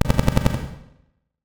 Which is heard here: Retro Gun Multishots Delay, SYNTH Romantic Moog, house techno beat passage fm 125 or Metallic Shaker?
Retro Gun Multishots Delay